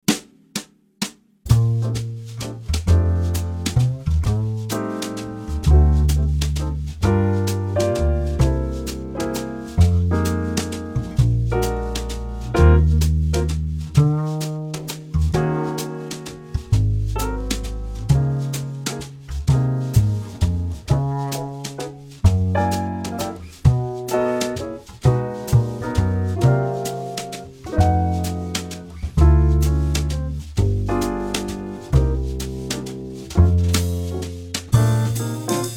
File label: free solo backing